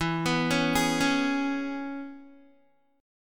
E 6th, down, Guitar; arpeggiated

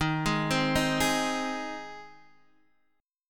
C Minor Sharp 5th, D sharp, chord

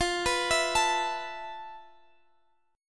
F Major 7th Flat 5th Guitar Drop D, chord; x,x,15,16,17,17; down; chord shape

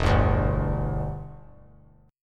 E Minor 7th, down; strummed

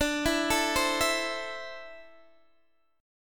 D 7th Suspended 2nd down Guitar DADGAD; D7sus2; arpeggiated

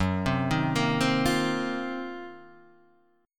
Eb Minor 6th Add 9th Gb, guitar chord

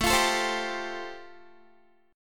A-Minor 7th Sharp 5th, Guitar, chord; 17,20,19,17,19,x; strummed; Am7#5

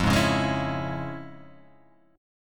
F Minor Major 7th Sharp 5th Guitar Half Step Down

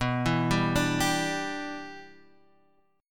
B Suspended 4th Sharp 5th Add Sharp 9th B; Bsus4#5add#9 arpeggiated; JGuitar

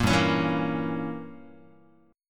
G Diminished 7th Guitar, Gdim7 chord; strummed; JGuitar

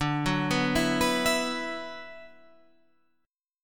D 6th Add 9th D, Guitar, Half Step Down

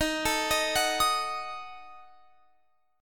Eb Diminished, Guitar, Open F; Ebdim arpeggiated

